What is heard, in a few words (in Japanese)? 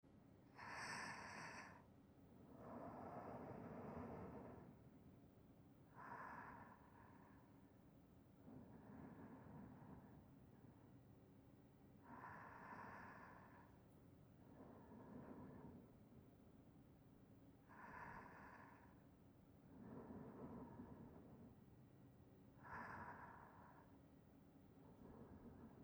寝息ループ（ループトラック）, ASMR; 蓄音レヱル; スパイラル&電動吸引耳かき・お昼寝